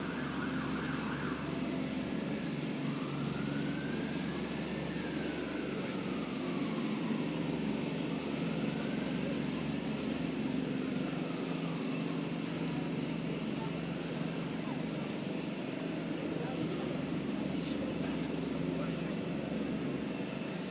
peace and quiet and the chirping of tersely birds in Beech Rd park